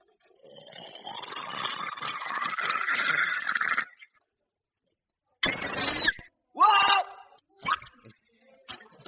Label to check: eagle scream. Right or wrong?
Right